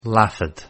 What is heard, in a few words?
laugh